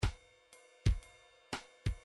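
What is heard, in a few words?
drums rock swing, midi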